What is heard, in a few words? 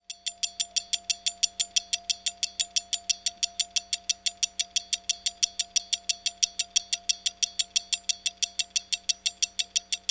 pyle mod piezo disk